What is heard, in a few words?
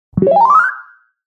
Activation